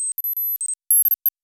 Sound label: computer beeps; sc cyclone